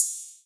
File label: Ride, Cymbals